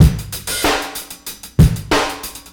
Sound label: Trashcan, Hip-Hop Street Beats; Sonic Foundry (Sony Creative Software); Hip-Hop Street Beats Construction Kit; Street Beats by Poogie Bell